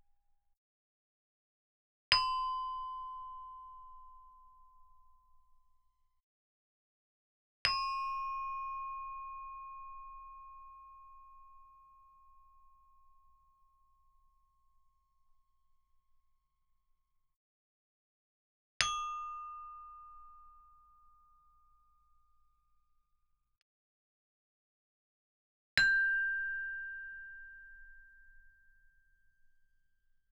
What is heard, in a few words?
Gamelan; Propeller Island - Complete Gamelan